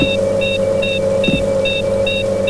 Sci Fi Loop

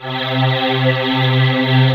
AIR VOX C2, VOICE; AKAI S-Series CD-ROM Sound Library VOL-7

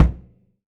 Prepared Drums

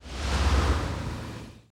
MED MOV, SWISHSWO; Total Control - Action Cutz

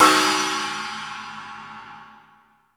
CHINA GONG; Crypton - DrumBase5000; GO GO DRUMS

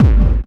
KICK hardcore, Techno Trance DRUMS

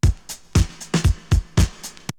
Drumloop; ZERO G - Datafile DISC 1

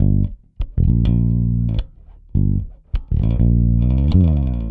Rock, Bass; Bass X

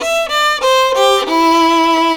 VIOLON A, Indian Traditions; USB Soundscan vol.45; AKAI

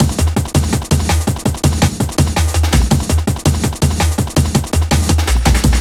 165, Drum Loops, Drum & Bass; Total Drum&Bass

